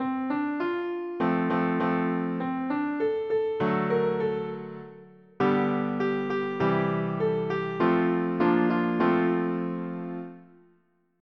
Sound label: piano; normal tempo; PlayScore